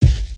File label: Spider Footstep; creatures organic